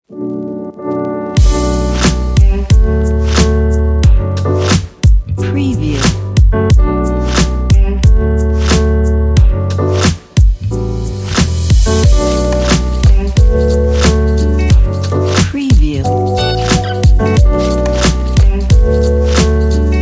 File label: vlog background